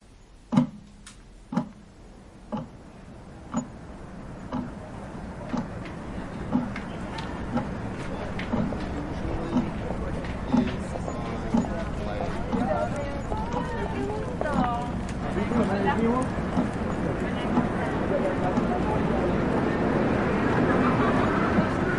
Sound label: Competition Soundscape; survival of the fittest